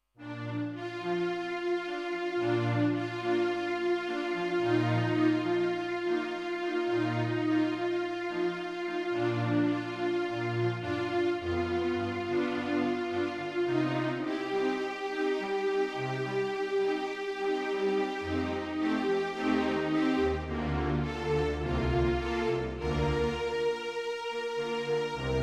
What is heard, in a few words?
Strings